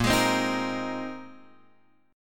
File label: Bb 7th Suspended 2nd Suspended 4th down Guitar Open F; Bb7sus2sus4; strummed; JGuitar